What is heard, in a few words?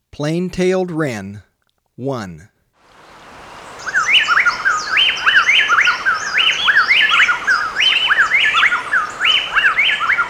Plain-Tailed Wren, Birds of Ecuador; S. America